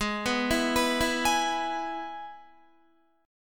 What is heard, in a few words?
Ab Minor Sharp 5th chord, Guitar; arpeggiated; 15,14,16,16,16,16; 4F,4A,5C,5G,5C,6E